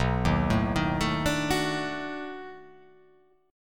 B 7th Sharp 9th, down

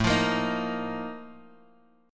Bb Major 7th Suspended 4th, A, chord

